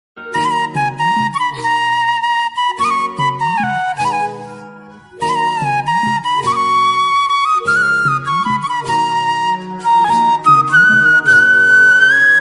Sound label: love marathi instrumental instrumental flute marathi